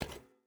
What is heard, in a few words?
footstep barefoot metal walk; Rust